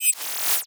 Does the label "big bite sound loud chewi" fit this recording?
No